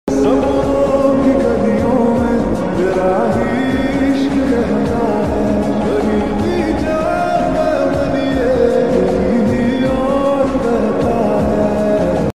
beautiful best ringtone mobile lofi song ringtone soft instrumental ringtone